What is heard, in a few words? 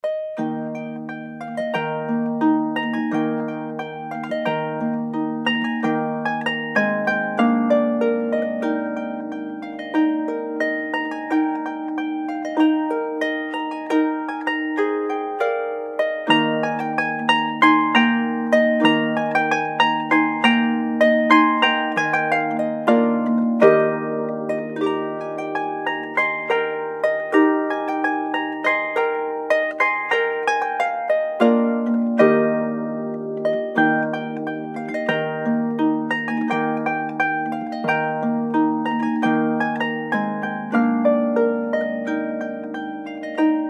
harp; Spring Vivaldi